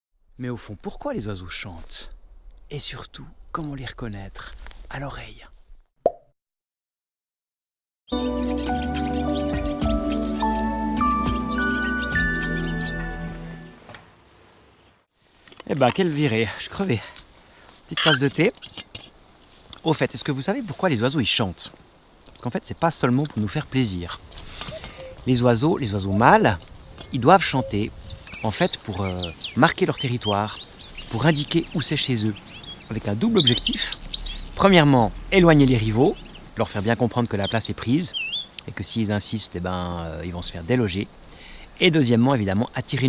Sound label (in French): chants d'oiseaux